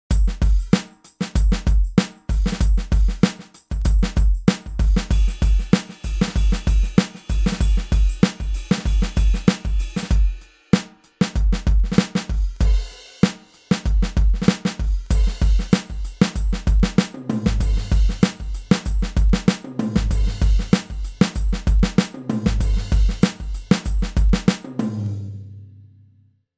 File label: Vintage Kit; live instruments